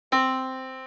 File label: Yamaha Disk, piano sound